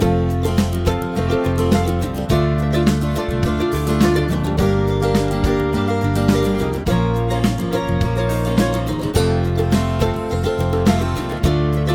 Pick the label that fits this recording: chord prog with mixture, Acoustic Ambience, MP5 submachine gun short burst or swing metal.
chord prog with mixture